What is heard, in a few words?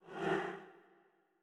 Orb Activate